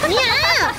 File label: noise